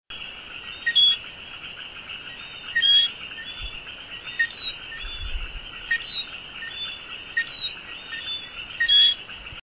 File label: eleutherodactylus johnstonei grand etang national park grenada; softer alarm call, suddenly shifting the pitch of the same; West Indian